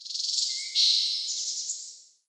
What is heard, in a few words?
ambience sanctuary birds